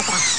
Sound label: Exhaust Sound; ST-XX Amiga Soundtracker Sample Packs